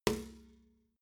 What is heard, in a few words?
metal bucket hit bottom soft